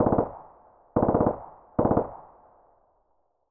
ppsh burst sequence slow, weapons; SPT Battle Ambience

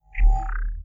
Menu Trader Press; Custom UI Sounds; Call of Duty Black Ops 3

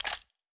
Menu Weapon Disassemble; Custom UI Sounds; Insurgency Sandstorm

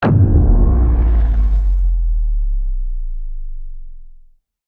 Generator Turn Off; Custom UI Sounds; Call of Duty Black Ops Cold War

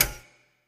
Menu Open Container; Custom UI Sounds; Call of Duty Black Ops 1

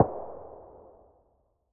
adar single; weapons; SPT Battle Ambience

